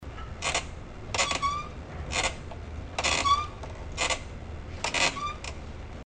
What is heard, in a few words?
creak, Kawasaki Ninja 250; motorcycle; The Ominous Creaking